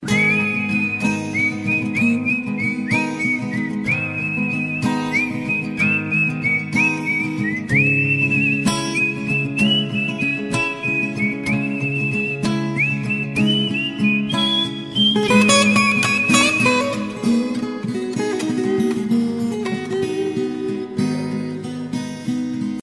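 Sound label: guitar and whistle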